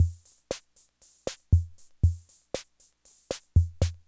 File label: Loop, classic machines, rhythm77